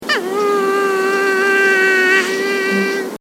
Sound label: otter